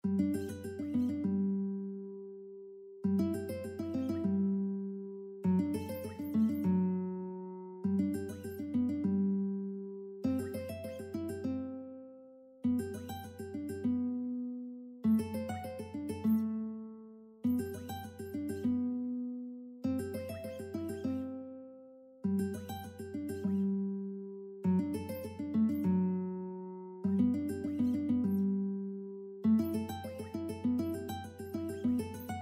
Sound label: estudio ponderoso guitar